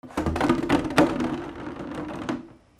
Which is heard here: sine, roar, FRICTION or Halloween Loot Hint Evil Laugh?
FRICTION